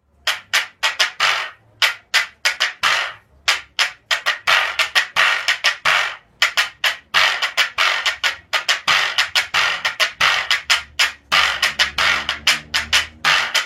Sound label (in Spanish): Quijada Burro, garifunas